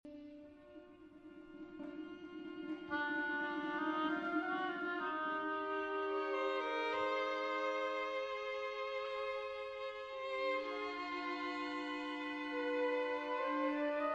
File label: miniatures fugue